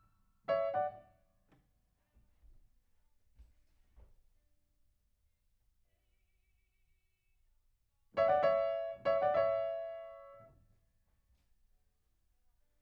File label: Piano C